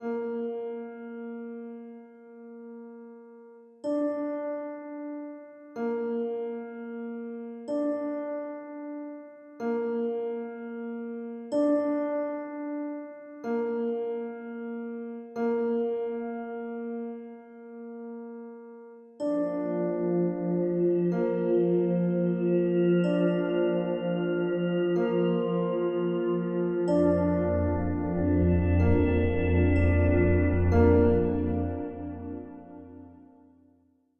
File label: Mission Drone, Piano